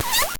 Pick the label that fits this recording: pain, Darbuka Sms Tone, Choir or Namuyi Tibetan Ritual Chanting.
pain